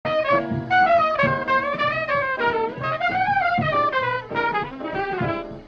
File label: melody